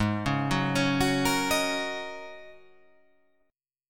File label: G sharp 9th Suspended 4th G sharp